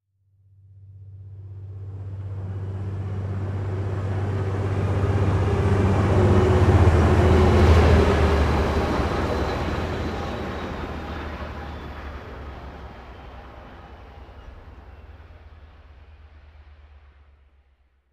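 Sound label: locomotive passing by sound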